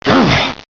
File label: stunned; xinalized